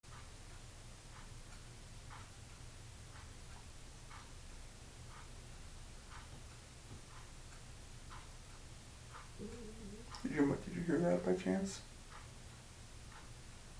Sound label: knock & moan; EVP